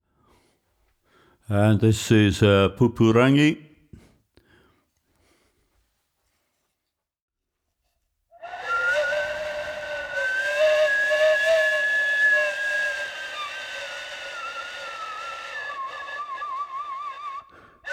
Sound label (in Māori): Pupu Rangi